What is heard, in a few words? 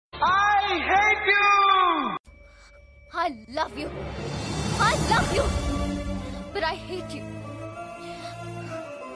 Kick; Aarya